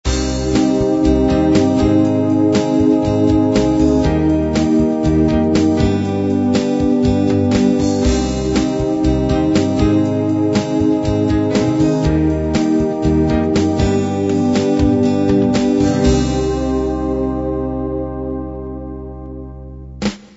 classic beat end

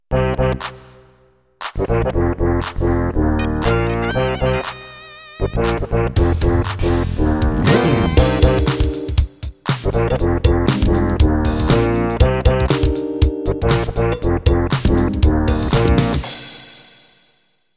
Rap Intro

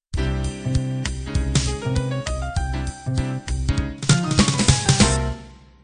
bop end

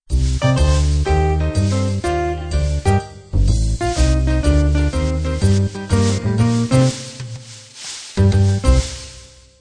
jazz quintet end

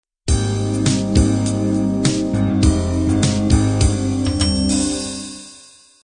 color beat intro

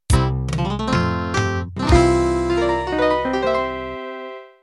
country-pop ballad end

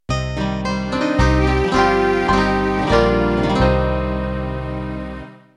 Country Waltz end

